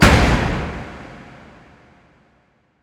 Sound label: Impact Door Slam; Ed Edd n Eddy; Adromulus Pack